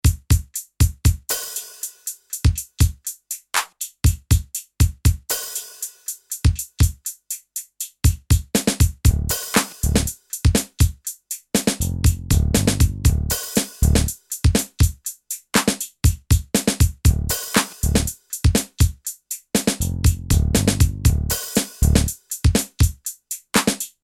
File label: Kawai XD-5 Groove and Bass